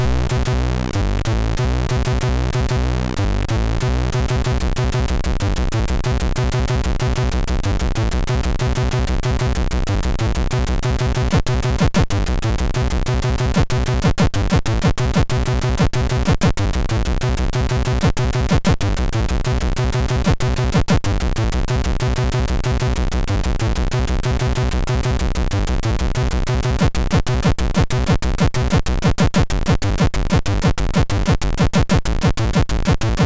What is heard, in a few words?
game, MOS6581R3; Blood 2; SilverFox